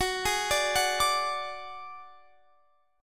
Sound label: F sharp Suspended 2nd Sharp 5th